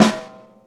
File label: Hollow Snare, Snare One-Shots; Drum n Bass; KJ Sawka; Drum n Bass & Beyond; Drum Tool Box